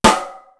PIPE SNARE; East West; Global Sounds

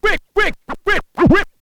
scratch short, Vinyl scratches

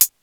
808 CL HAT; Greytsounds VOL-1; CLASSMACHINE

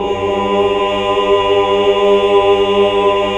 AAH 2 7 L; Best Service Gigapack 1

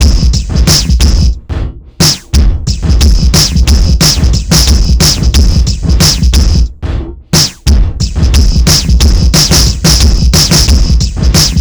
hiphop; INIS; Funky Xtreams

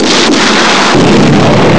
big explo; ST-XX Amiga Soundtracker Sample Packs

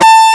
EG DIST BA4, FUNK

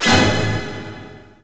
hit, orchestra hit; Greytsounds VOL-1

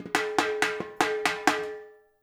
FILL, Percussion

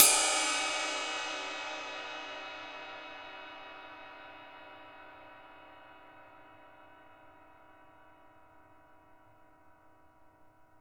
RIDE, Drumworks; Sampleheads - New York City Drumworks VOL-1

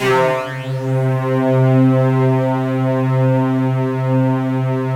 SQUEAK C2, Yamaha VP-1; Music Store

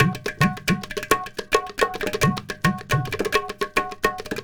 India, Heart of Asia

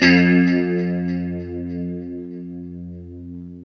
LO E F 2, Guitars; Spectrasonics; Hans Zimmer Guitars VOL-1; JC-SHEEN

